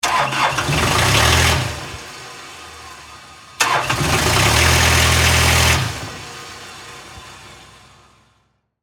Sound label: Lawn Mower Stall, Toro, Reelmaster, 5200-D, Start, Golf, Course